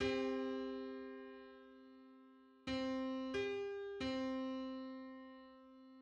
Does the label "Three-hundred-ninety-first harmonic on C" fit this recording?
Yes